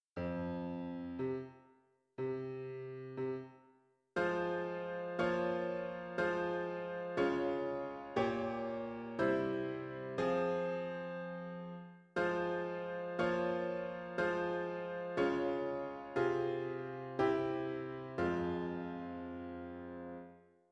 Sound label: Piano